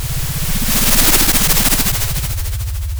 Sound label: Sound of waves crashing on a shore